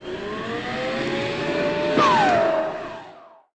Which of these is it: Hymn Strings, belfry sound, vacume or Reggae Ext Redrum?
vacume